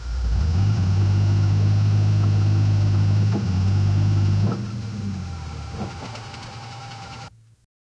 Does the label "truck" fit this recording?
Yes